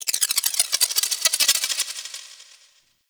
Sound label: Sculpture Spin; Ambience; Mountain Pass; Oro Sculpture; Warframe; The War Within